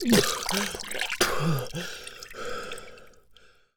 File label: Air, Water, Humans; zps; HM Water